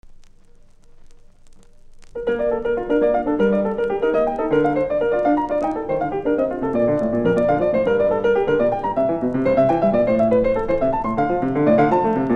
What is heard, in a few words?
Partita No1 Courante; Bach; The Six Partitas; Glenn Gould